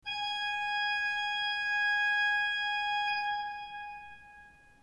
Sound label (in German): ton, orgel; Spielereien auf der Bildschirm-Tastatur